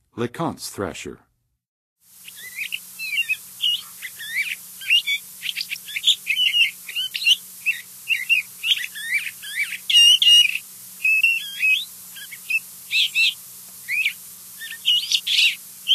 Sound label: LeContes Thrasher, Songs of Birds; North America; Western USA; Western USA Songbirds